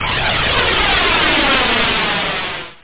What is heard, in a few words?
zoom stereo, Effects; Workshop